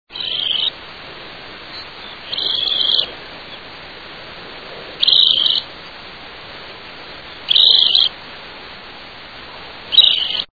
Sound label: Western Sandpiper, songs